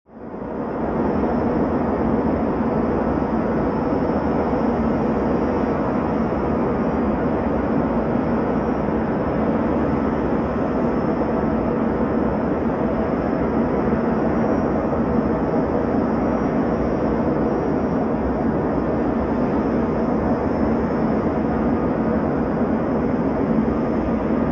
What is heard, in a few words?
Propeller Engine Noise from Inside Cabin